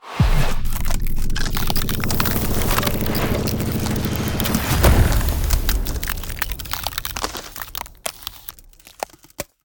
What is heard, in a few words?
Ice Spell Transformation Giant Growth; Arctic Aura